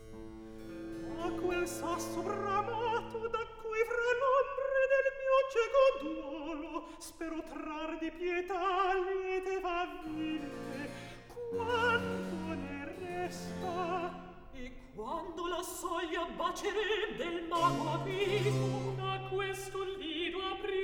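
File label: Recitativo, Handel opera; David Daniels; Act 2; A quei sassa bramato; Handel - Rinaldo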